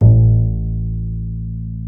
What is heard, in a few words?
Bass, Roland